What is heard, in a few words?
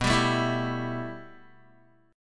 B 7th Suspended 4th Sharp 5th, Guitar; B7sus4#5; strummed